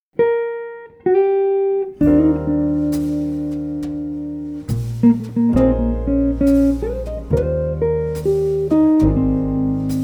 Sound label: Trio Quartet